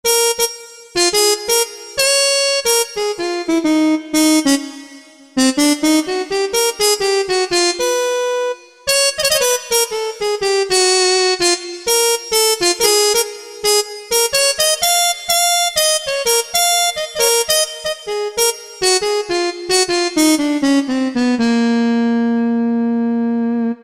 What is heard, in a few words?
Br, Yamaha EX5R; Factory presets; Br-Miles